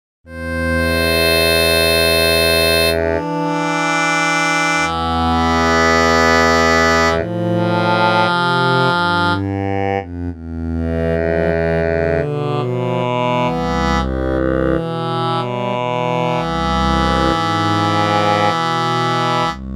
vocal swell

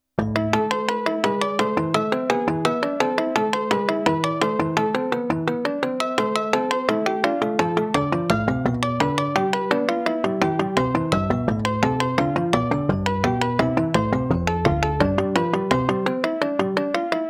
Bach honky funk

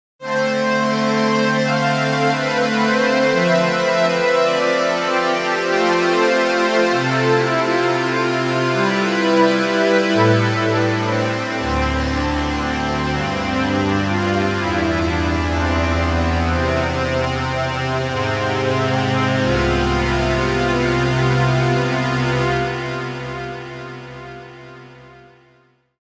strings wash; Constellation M42

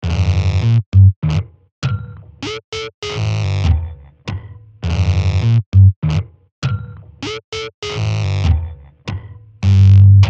distort

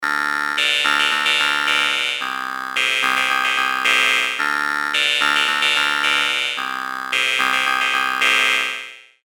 Strong Buzz

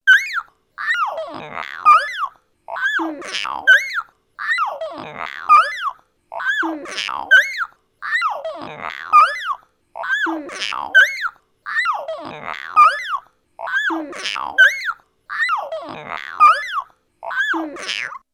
cat scream; Cortex

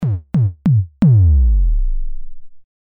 kick dist rev; velocity layers; Sidekick; percussions

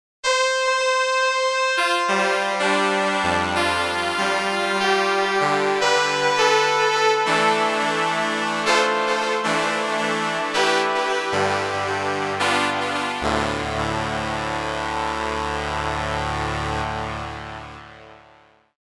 Big Brass; in Space; Cosmology

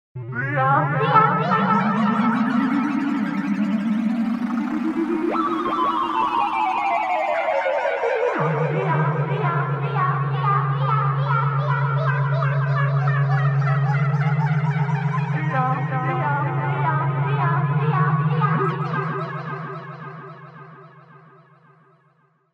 space call

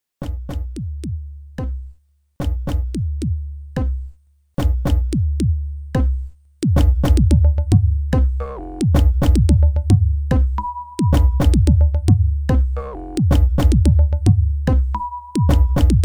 electronic percussions

